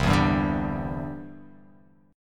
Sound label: C-Major Add 9th